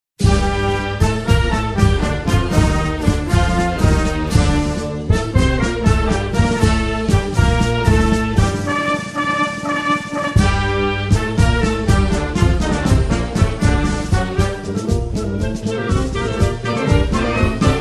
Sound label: Ringtone